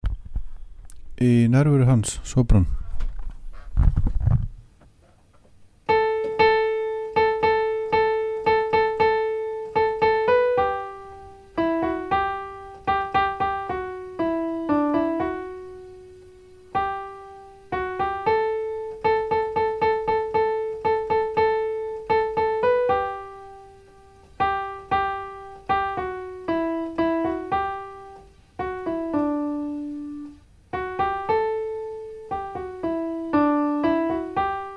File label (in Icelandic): sópran